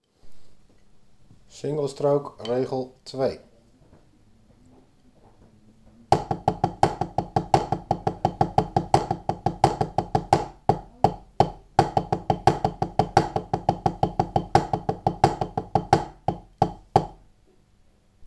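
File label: Single stroke roll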